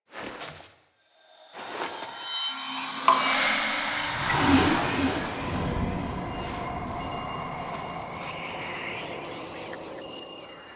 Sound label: Handshake; Orlick